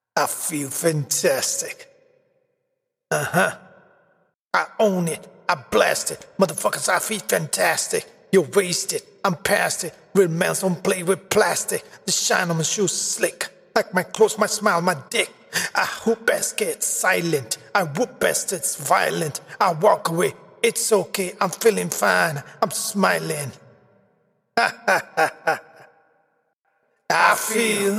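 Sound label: hip hop 128 A flat minor, Acapella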